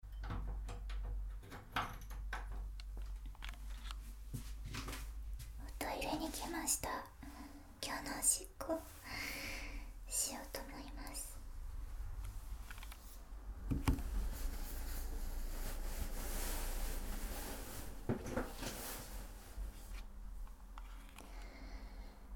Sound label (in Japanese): おしっこ, 寝起きおしっこ